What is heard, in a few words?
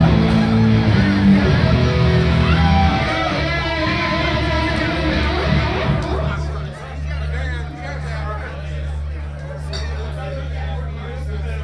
Really Short Guitar Solo And The Audience